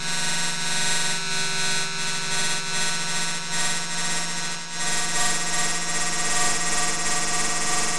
sicky drone; meat madness redux; godot

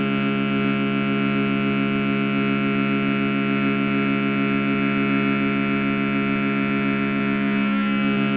vocal drone; goodnight jellybean; godot

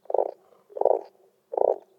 croak; goodnight jellybean; godot

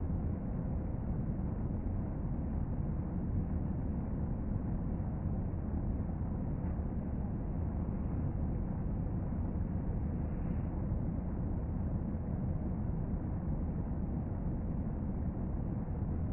ambient drone; meat madness redux; godot

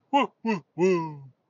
hearty chuckle; SlimeoosOdyssey; godot

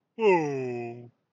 sad sound; SlimeoosOdyssey; godot

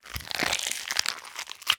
wet crunch; meat madness redux; godot; tentacle Sfx

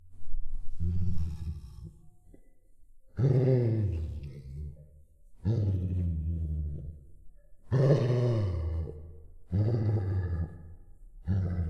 groans; meat madness redux; godot

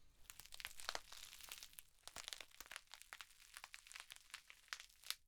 skin crawling; meat madness redux; godot; tentacle Sfx

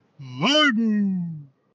slime; SlimeoosOdyssey; godot

